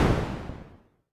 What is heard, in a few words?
jeep fire; armora